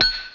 TING; Zoemtonen hisses pingen woesjes tikjes

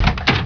DOOR

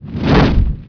whoosh

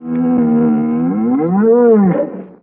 vox bantha

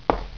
KNOCK

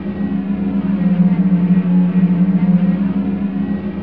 passby small ship loop

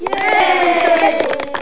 cheer, Menigtes; sound effect

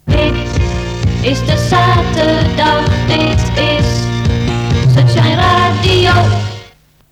sun Shine Jingle; SunshineJingles

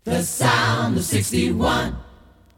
jinglepackage; topformat LP jinglepackage 2

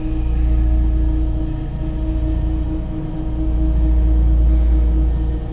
amb deathstar loop

